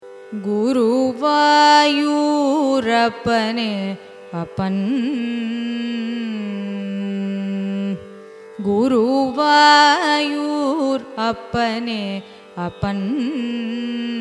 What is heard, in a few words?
raga reethigowlai carnatic; carnatic music